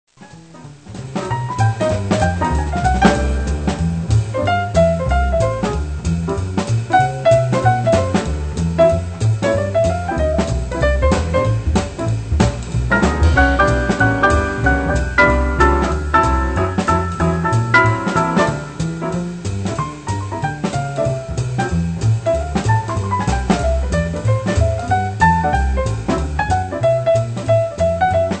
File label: piano; Jazz Conception for Piano